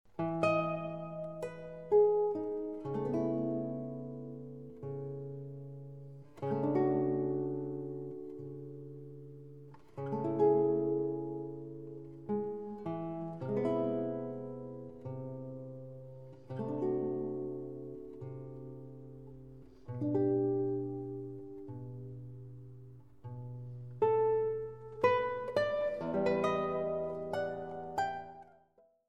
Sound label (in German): Gitarre